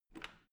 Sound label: washing machine snap button press out